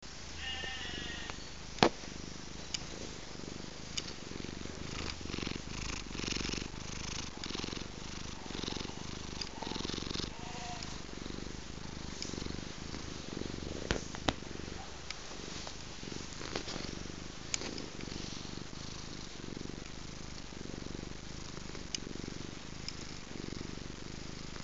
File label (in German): schnurren